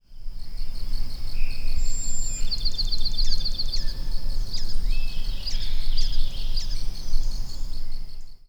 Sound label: Bachmans Sparrow